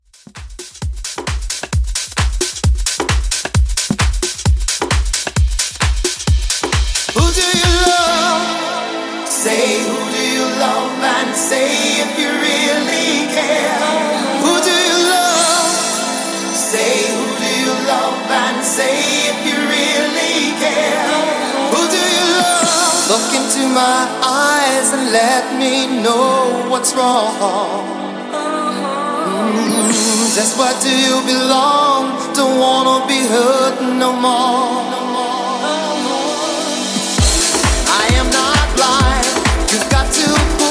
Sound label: 90s Dance Mix 132 bpm